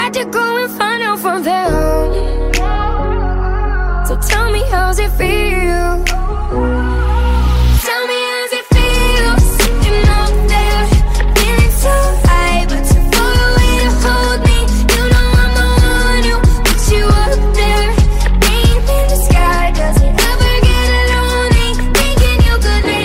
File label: sad english ringtone; english ringtones